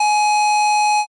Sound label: censor beep